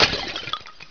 SHATTER

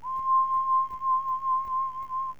JUPITER, SYNTH; JUPITER6

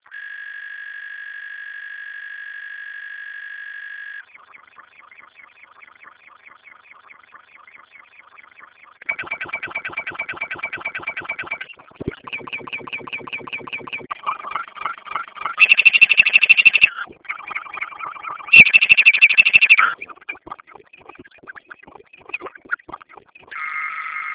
PYRO

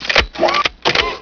pickup

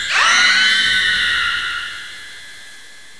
EERIE

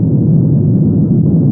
ENGINE

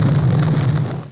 WALL FALL

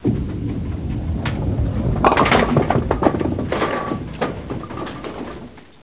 bowl

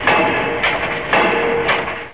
pile driv

